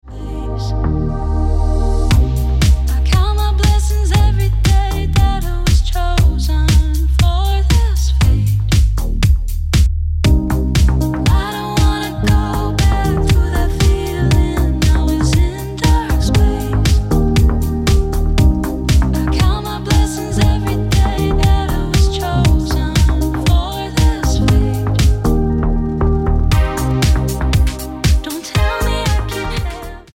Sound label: Ringtone